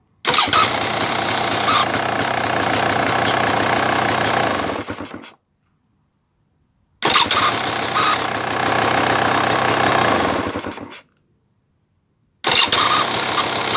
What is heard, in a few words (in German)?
Starten